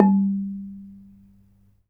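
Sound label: ranat thum G3; Spectrasonics - Heart of Asia CD2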